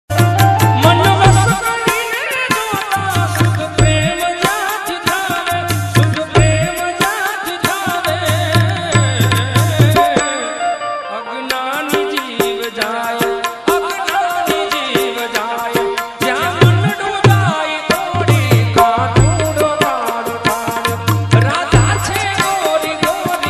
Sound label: gujarati ringtone